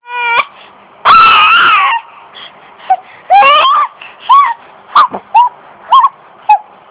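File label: baby hungry, male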